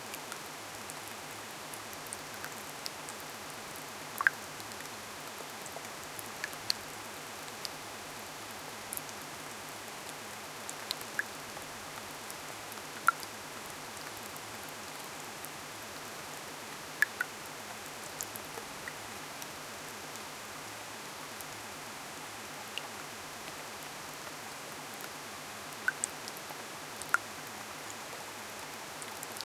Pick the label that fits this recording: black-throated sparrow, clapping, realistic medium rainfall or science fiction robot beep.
realistic medium rainfall